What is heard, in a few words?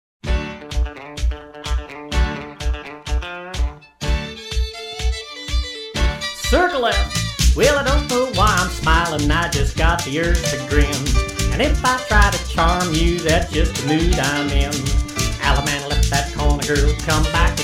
mama warned you vocal basic; square dance music